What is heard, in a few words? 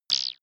digital spring